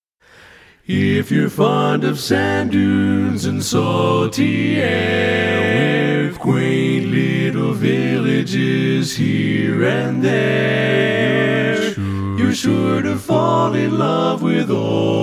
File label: Full Mix